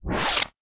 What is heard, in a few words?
Rattle slide rev